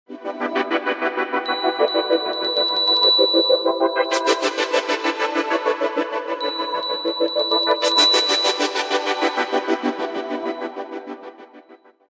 nokia n91, dzwonek

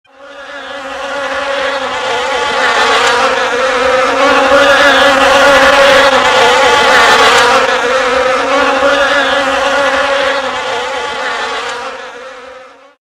odglos brzeczenia pszczol

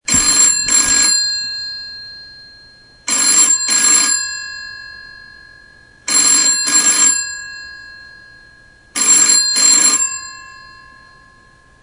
Klasyczny Antyczny Telefon

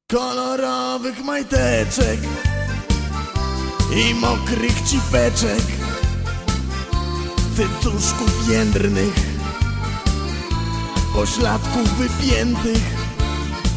disco polo na wesolo

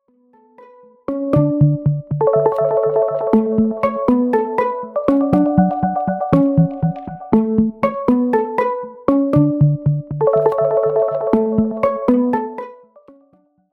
Nokia 6 Neon, dzwonki; telefon komórkowy

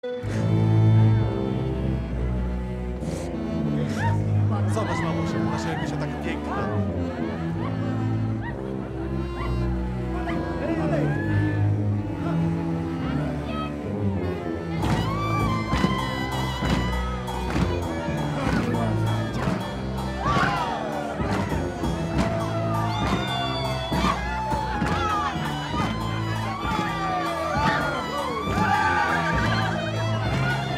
mazur weselny; chlopi

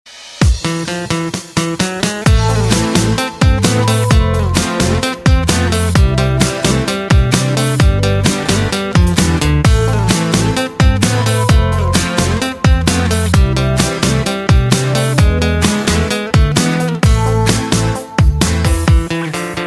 goracy ton muzyczny tiktok

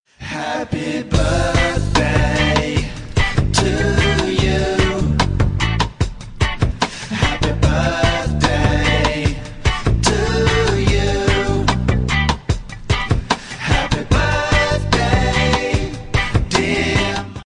styl reggae, dzwonki; POP

